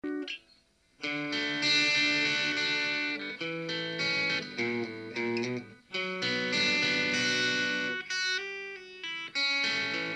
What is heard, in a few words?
Arpeggio